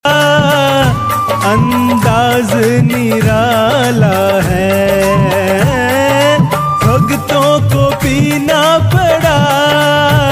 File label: Khatu Shyam Bhajan